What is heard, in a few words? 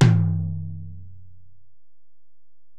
Tom, Alesis DM-PRO